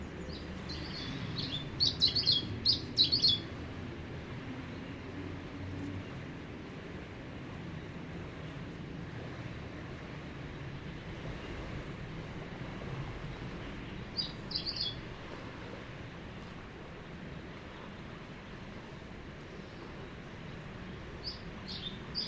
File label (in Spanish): Comesebo Patagónico, Phrygilus patagonicus; EcoRegistros